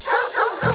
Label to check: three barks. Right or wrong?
Right